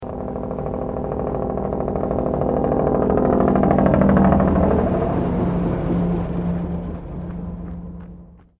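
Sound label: diesel; deisel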